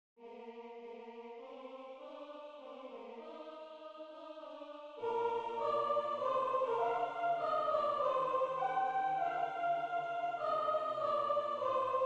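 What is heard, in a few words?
mend soprano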